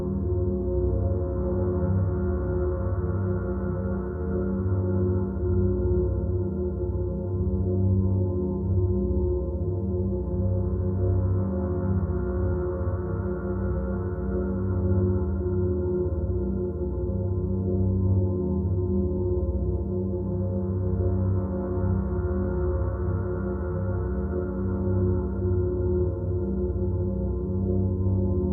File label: Dark Atmosphere Looped